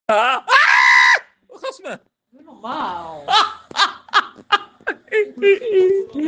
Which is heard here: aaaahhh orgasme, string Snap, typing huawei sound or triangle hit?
aaaahhh orgasme